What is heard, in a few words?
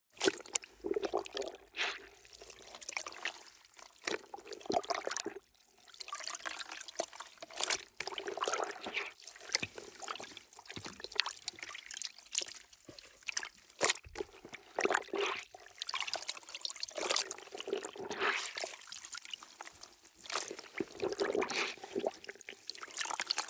clothes water wring sequence